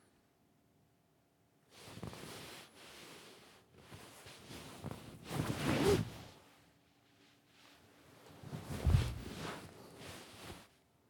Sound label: Couch Quick Rise Up